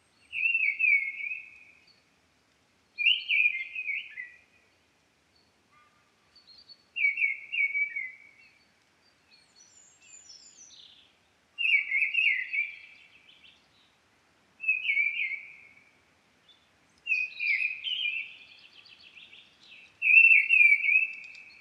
dubbeltrast Turdus viscivorus

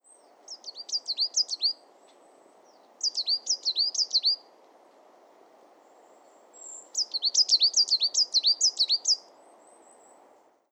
svartmes Periparus ater sang

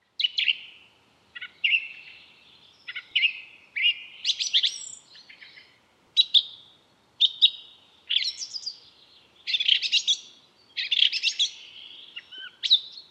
taltrast Turdus philomelos

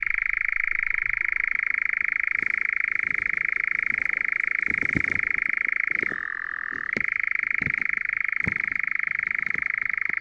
nattskarra Caprimulgus europaeus

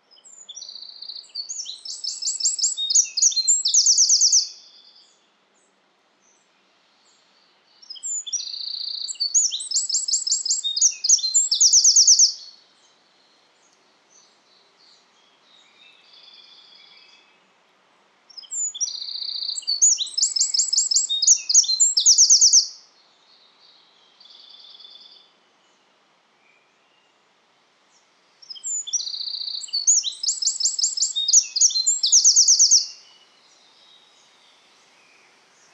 gärdsmyg Troglodytes troglodytes

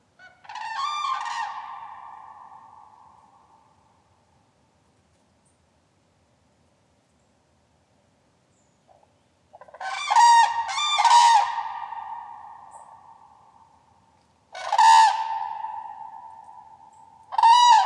trana Grus grus

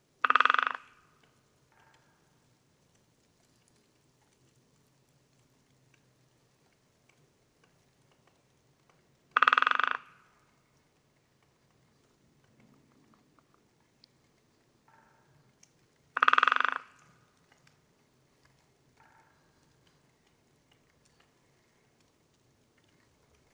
större hackspett Dendrocopos major trumning

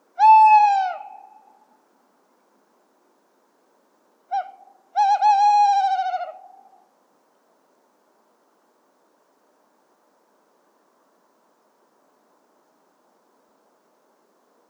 kattuggla Strix aluco sang

